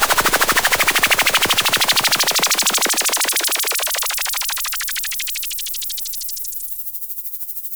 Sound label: Yamaha CS5, Filtered Noise